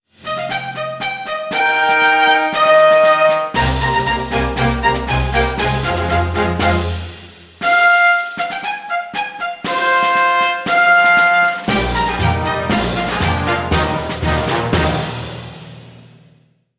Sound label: Ceremonial Brass; Sting